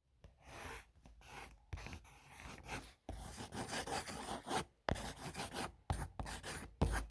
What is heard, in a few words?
Drawing